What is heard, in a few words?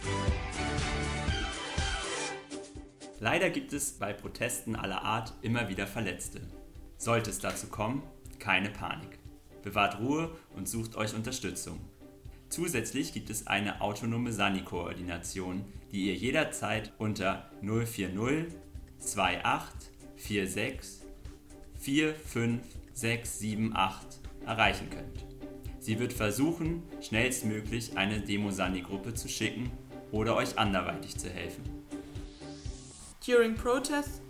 Jingle; Sani